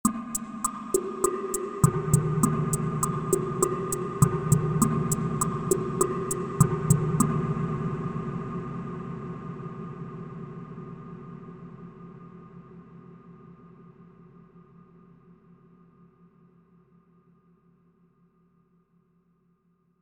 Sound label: mambo